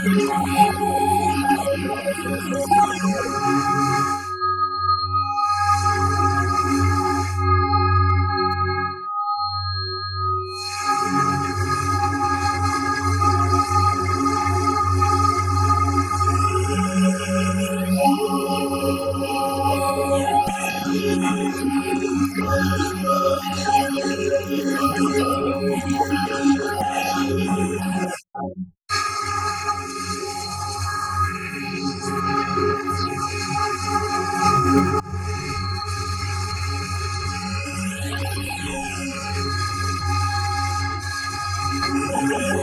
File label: Ab; stupid machine; M8